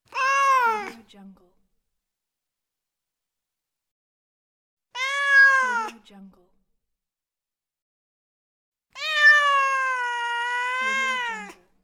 cat; winter soundfx

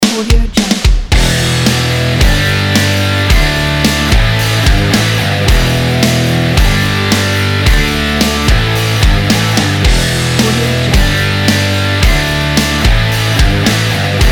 rock riff

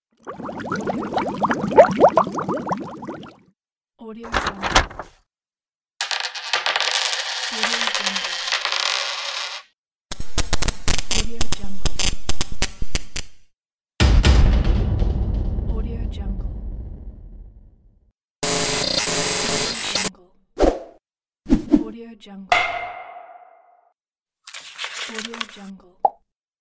infographics sound effects

